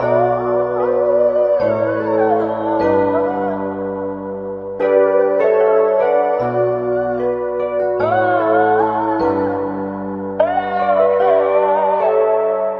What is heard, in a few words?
keys and voice sad mystical; Loops